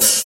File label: HH, Dance Mega Drums; Best Service; HH-HIP